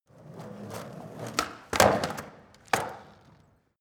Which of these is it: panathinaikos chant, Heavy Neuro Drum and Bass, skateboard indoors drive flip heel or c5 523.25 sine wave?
skateboard indoors drive flip heel